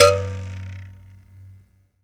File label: BUZZ, Buzz Kalimba; Roland LCDP11 Africa VOL-1